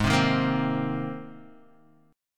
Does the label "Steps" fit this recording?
No